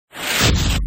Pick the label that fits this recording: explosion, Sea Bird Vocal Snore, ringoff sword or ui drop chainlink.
explosion